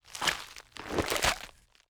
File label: gather meat